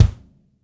Gretsch Kick Room; 1967 Gretsch Kit; One-Shots; Sonic Foundry (Sony Creative Software); Vital Drums

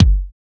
BD, BD Dance; Sound & Vision; Gigapack I CD 1

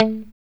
A, Strat pop, Guitars; Spectrasonics; Hans Zimmer Guitars VOL-1; JC-STRAT POP

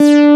MICRO MOOG; Moog Synths; E-MU Formula 4000 Series Vol. 3; Analog Odyssey

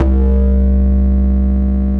BASS, Drum & Bass 160 BPM

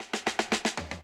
Classic Drum Loops

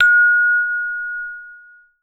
hand chimes; Voice Crystal - Steve Reid Definitive Percussion

